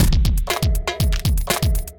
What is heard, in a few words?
Kit, Drums; Kindified Kits